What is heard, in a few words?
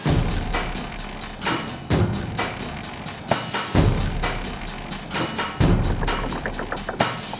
steampunk suspens rythm; mountain world